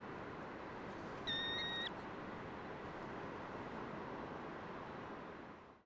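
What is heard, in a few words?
juvenile alarm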